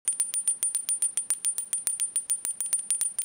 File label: bell